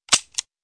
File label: Web Page Loading In Progress; ESAN VO Sound Schemes; ESAN Horror pack